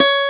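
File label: Steel Acoustic Guitar E3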